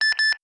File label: local end, radio sounds